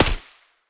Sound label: sa58 silencer mono distant